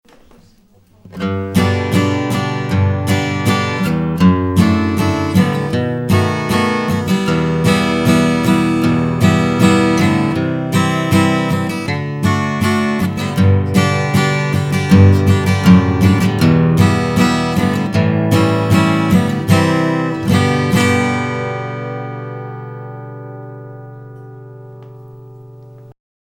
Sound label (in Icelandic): gítar